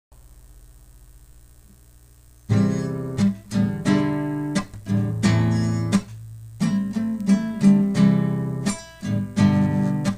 guitarra solo

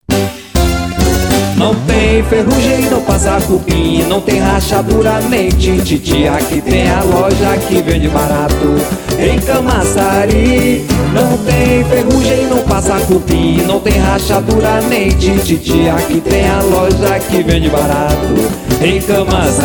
jingles

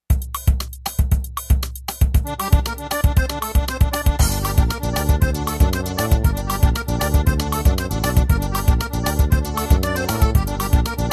ritmos yamaha forrozao pe de serra

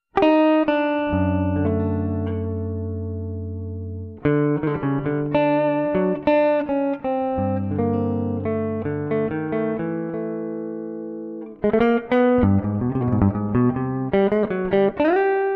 viola

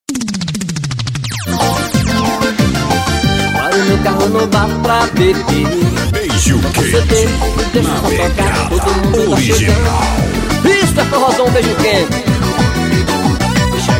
vinheta forro

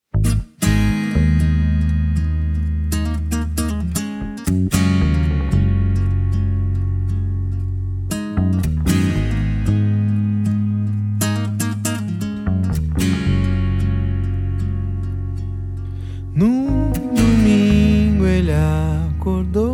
todo mundo acustico

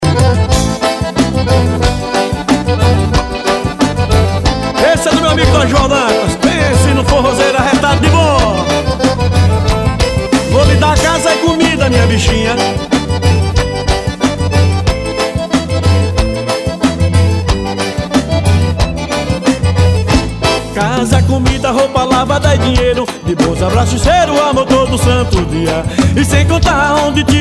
acordeon

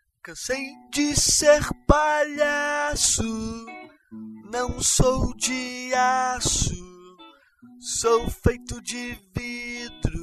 guitarra

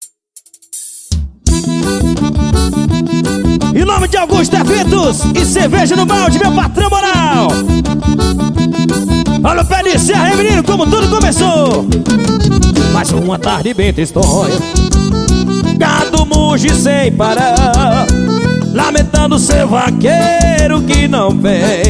forro xote

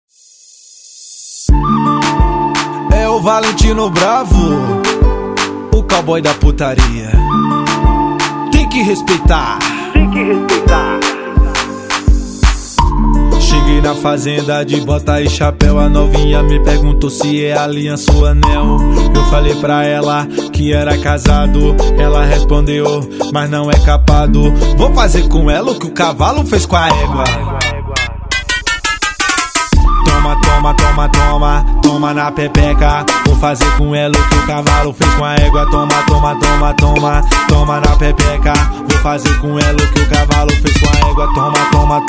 brega funk

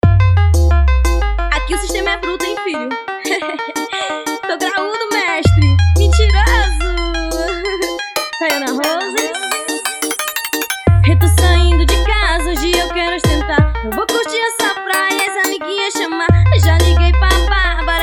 brega funk

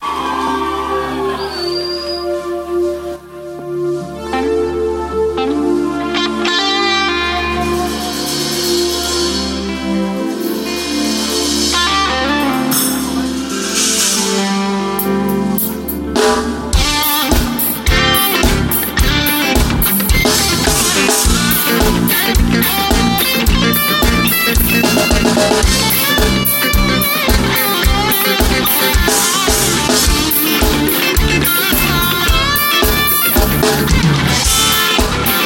abertura

